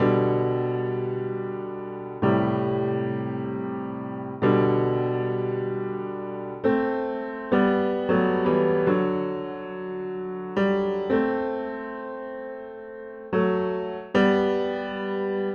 Country Piano, Bridge; Digidesign; Pro Tools Essential Loops

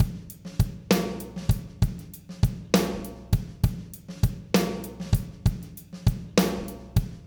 066 Pop Drums (128); Pop Rock; Slow Tempo; Digidesign; Pro Tools; Pro Tools Essential Loops

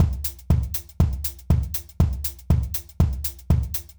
120 Pop Drums NoSnare; Pop Rock; Fast Tempo; Digidesign; Pro Tools; Pro Tools Essential Loops

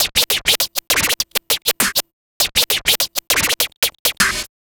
Vinyl Scratch Snare; Digidesign; Pro Tools; Pro Tools Essential Loops; Dance DJ

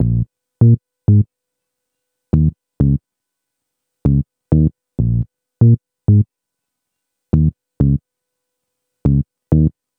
E-Coast HipHop Synthbass Bbmin; Digidesign; Pro Tools Essential Loops

